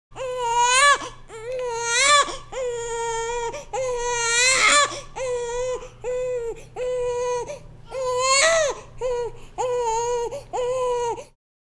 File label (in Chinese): 婴儿啼哭